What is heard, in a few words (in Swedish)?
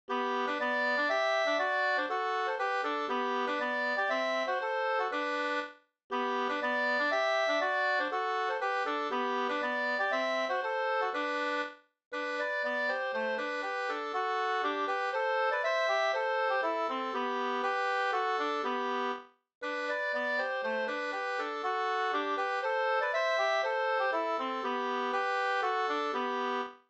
polska Slangdans; häfte 1, tryckt 1853, nr 14, upptecknad av Richard Dybeck